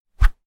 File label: Knife Swish Mixed